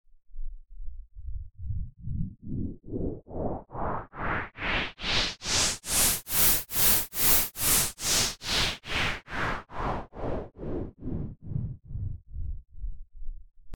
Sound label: Pink Noise Effix Delayed; Techno